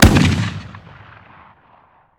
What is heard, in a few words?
shotgun fire atmo ext; gmod; viper